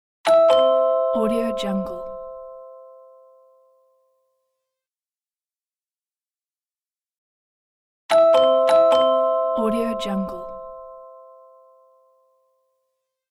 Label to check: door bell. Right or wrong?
Right